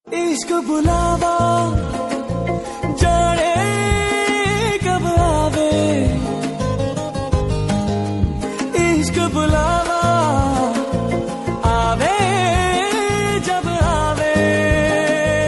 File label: Hindi song